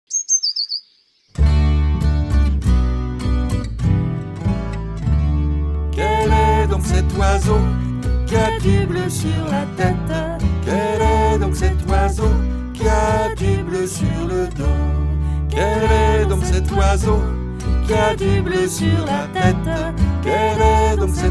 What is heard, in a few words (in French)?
Chanson La mésange bleu